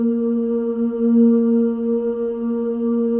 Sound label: OO, FEM OOS, Voices; Spectrasonics